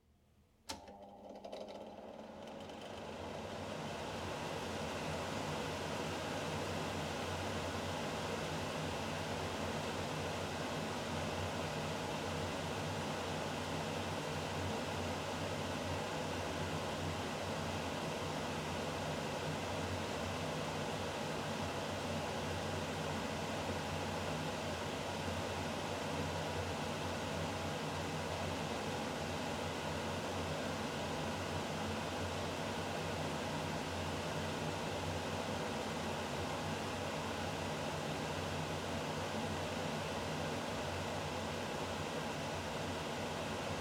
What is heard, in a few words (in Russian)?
vyt; neff